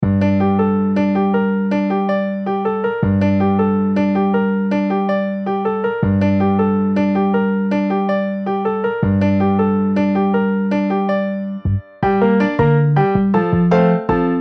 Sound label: Piano Bass MIDI